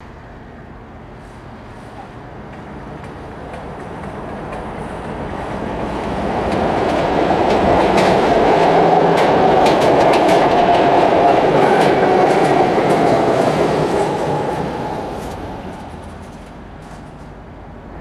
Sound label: electric streetcar passes by on tracks